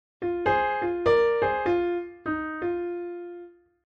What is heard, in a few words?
blues lick in f, piano